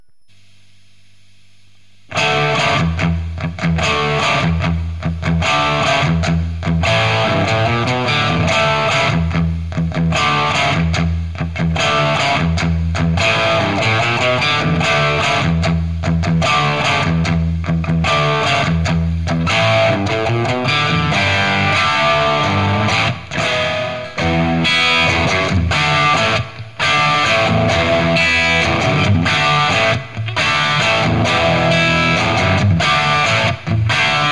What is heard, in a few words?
Marshall 2203